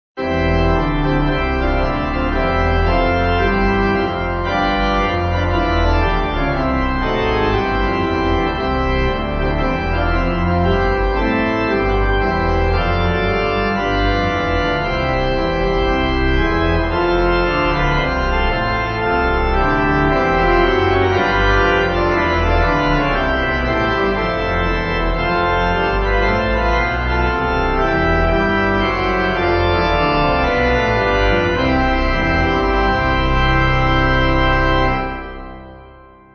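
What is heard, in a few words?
Pipe, Pipe Organ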